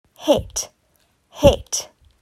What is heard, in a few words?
Hit